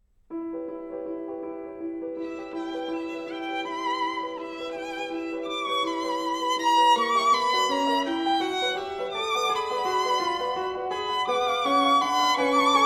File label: Jewish Wedding Dance